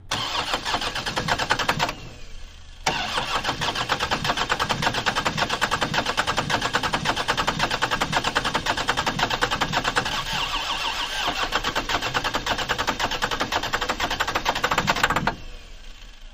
Car; False Starts, Short, Medium And Long Attempts With Starter Burns